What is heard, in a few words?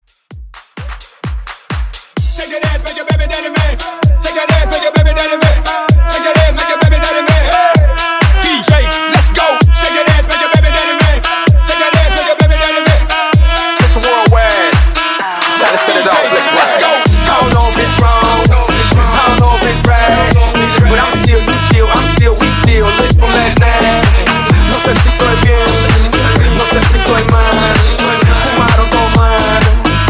DJ in outro mashup 129 bpm